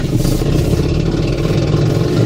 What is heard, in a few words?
Noisy Turning CV Joint